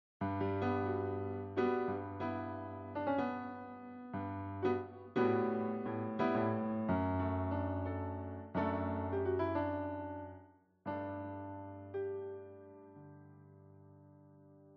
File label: piano